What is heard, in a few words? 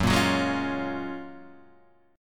Gb Major 9th, down, Guitar, Half Step Down